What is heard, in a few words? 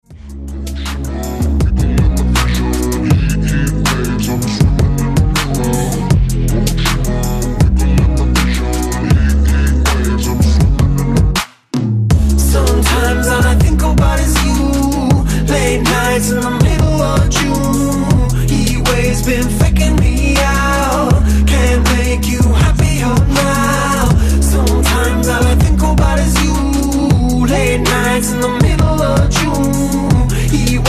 redrum breaker 80 bpm